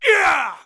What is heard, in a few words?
heavy, warrior voice